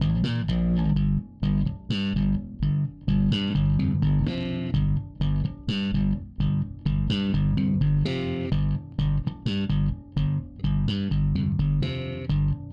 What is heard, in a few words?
Bass DI Reamp Sub